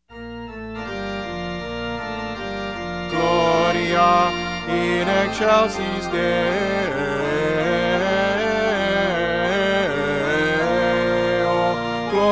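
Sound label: Fanfare Christmas, Alto; Shaw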